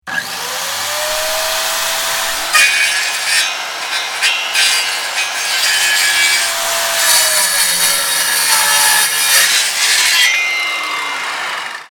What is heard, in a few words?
Industrial circular saw cutting metal